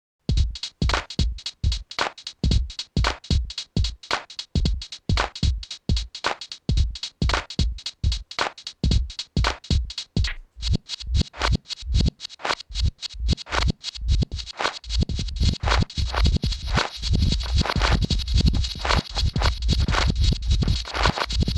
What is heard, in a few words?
beat session